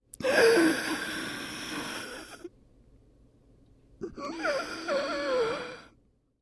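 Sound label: sounds of a drowning person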